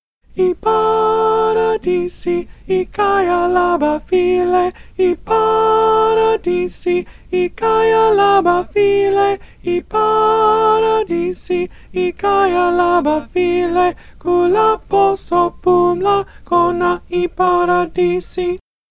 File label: Ipharadisi soprano